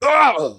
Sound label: worm death